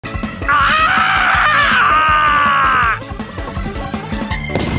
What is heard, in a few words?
AGHHH